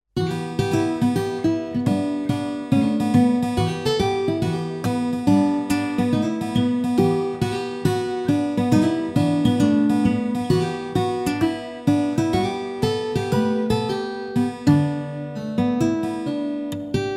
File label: RUNNING STRINGS